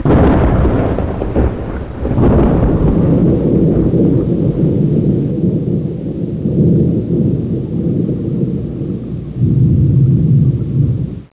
Thunder; halloween

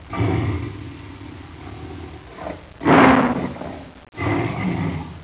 tiger, big cats

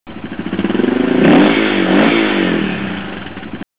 dirtbike

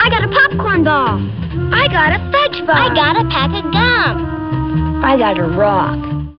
midi

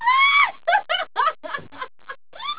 woman laff

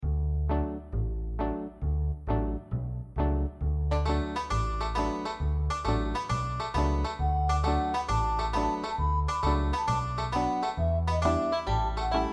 midi

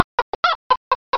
chicken cluck

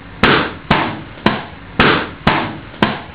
balloon

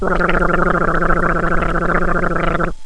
gargle

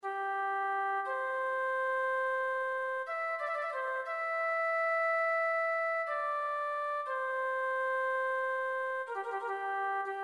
amazing grace flute

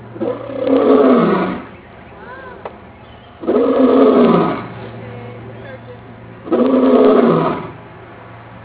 lion roar

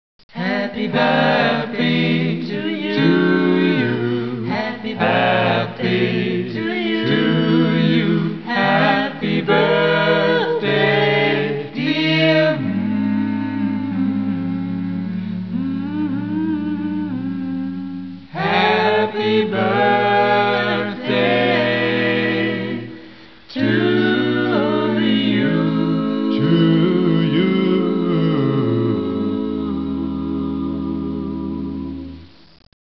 happy bd

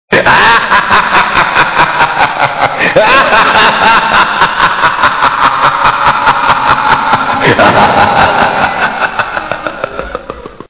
laugh; halloween

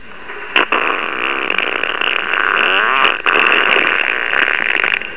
fart

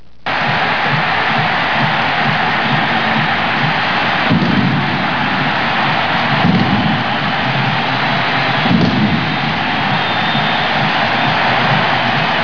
stad crowd, soccer

